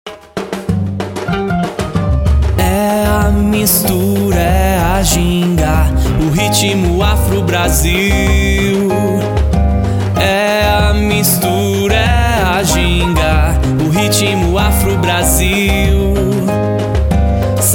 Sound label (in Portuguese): RITMO AFRO